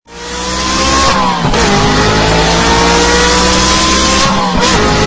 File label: ferrari sound